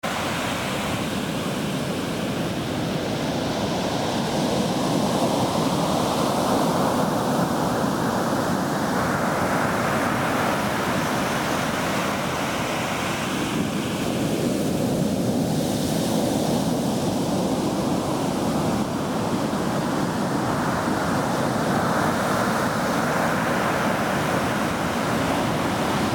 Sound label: pacific ocean; redwood creek; shasta; park